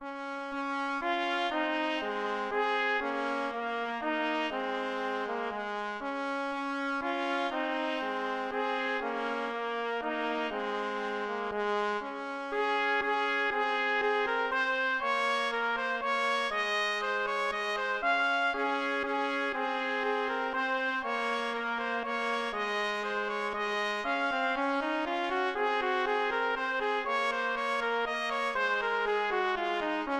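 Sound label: chaconne, trumpet duet; Mattheson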